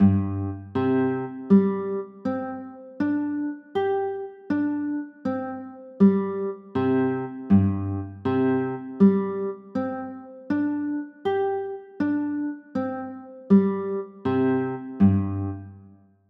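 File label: G sus4 chord, guitar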